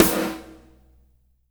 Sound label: breakdown, snares; Spectrasonics; Burning Grooves